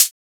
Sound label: Closed Hat; FLPRO